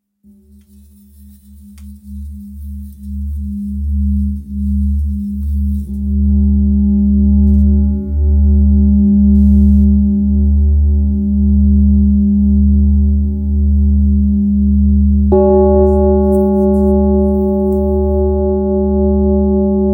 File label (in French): Bol ramgandi sol diese